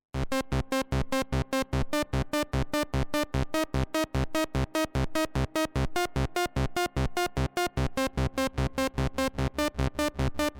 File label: Arp2600 polyphone 90er patch dry